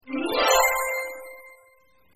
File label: end scene expert reached star; Delicious Emilys True Love